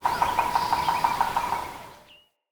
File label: Tree Pine Pecker; Trees; Midrath Island; Ambience